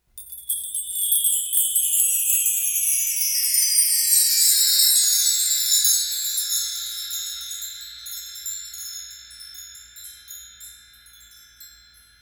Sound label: Erie Chimes, Halloween; Halloween Sound Effects